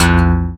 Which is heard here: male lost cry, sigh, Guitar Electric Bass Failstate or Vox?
Guitar Electric Bass Failstate